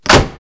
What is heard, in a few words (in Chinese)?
关门; 日常生活; 特酷声音素材库